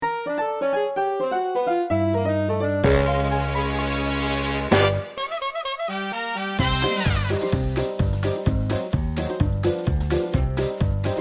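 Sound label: Clown Car, royalty-free music; Make it Magic Clowns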